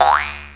starten

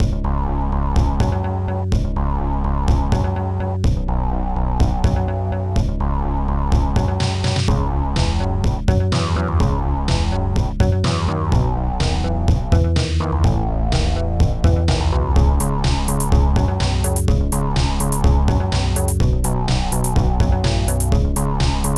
musik

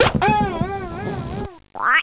Frosch

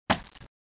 schlagen

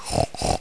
grunzen; A.Biolek Demo; biocart

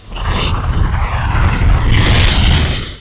Vulkan; PC Gamer IT CD 48; 1602 AD; 1602ad demo

